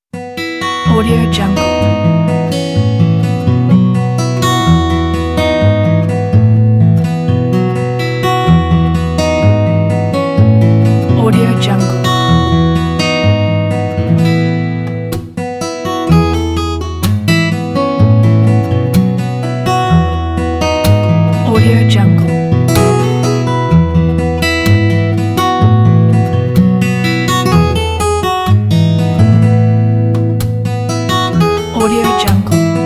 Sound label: summer inspiring folk acoustic guitar